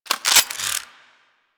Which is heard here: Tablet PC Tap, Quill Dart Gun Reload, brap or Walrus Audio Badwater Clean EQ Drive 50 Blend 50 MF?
Quill Dart Gun Reload